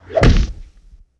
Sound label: Heavy Punch with bone crack